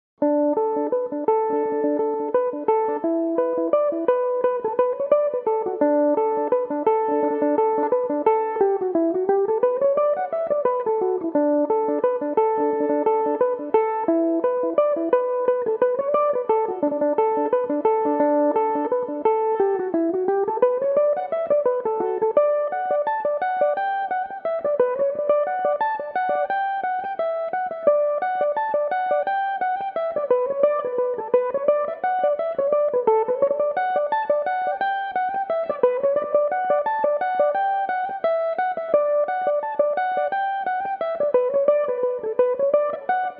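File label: reel D major, Irish mandolin; Killavil Reel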